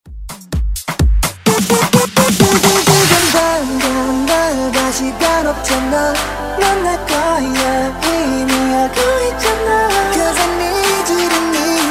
Kpop Afrobeat Epic Intro

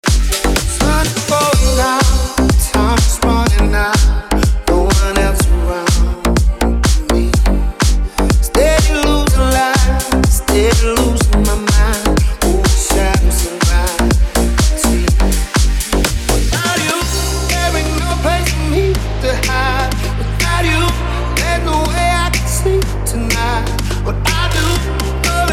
Club Party Banger

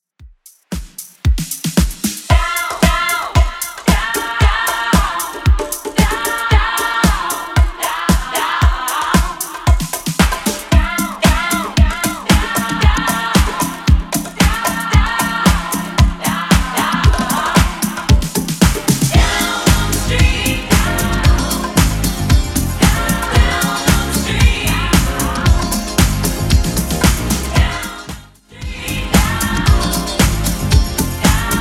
90s Redrum 114bpm

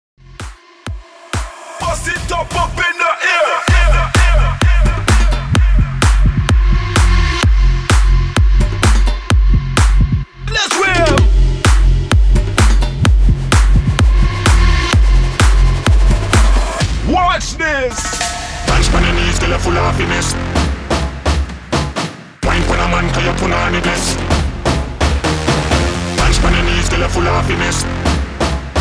VIP CUT 128 BPM

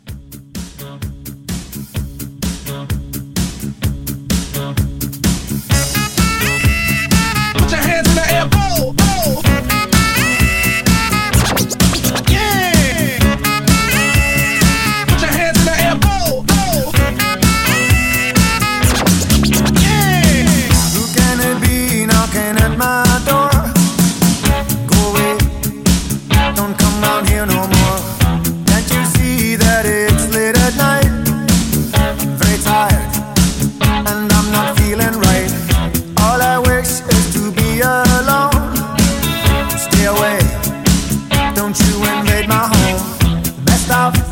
80s Hype Scratch Re Drum 128

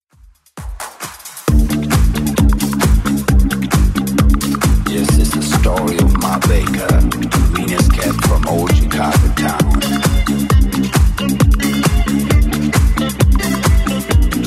70s Club 133 bpm

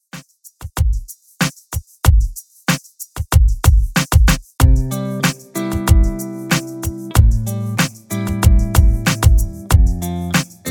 Pop Redrum

